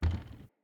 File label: Wood Stool Impact Light